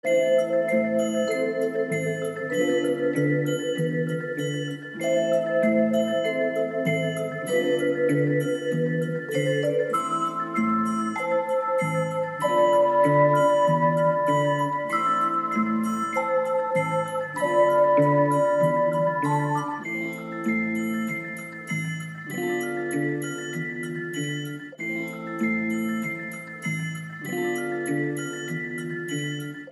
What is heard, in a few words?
194BPM